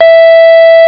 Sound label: Sound 8 mono 660 Hz; 660 Hz at 0,875 sec (Swedish Fire Alarm), 9250 Hz, 8-bit, Mono, 0,875 sec; ОПОВЕЩАТЕЛИ; Notifiers